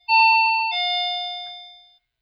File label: coin